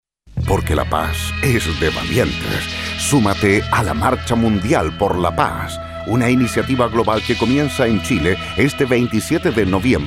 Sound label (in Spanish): karaoke por la paz